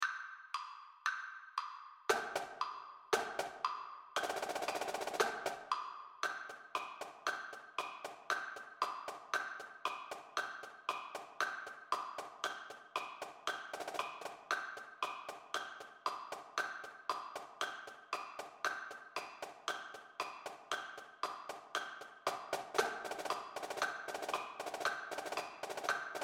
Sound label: Snare Drum; Snare Drum Only